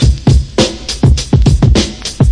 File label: 104 thick groove from vinyl, drum loops; 101 to 110 bpm